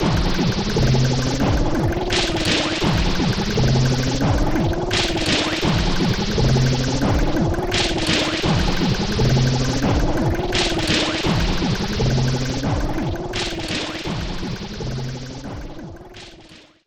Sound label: Factory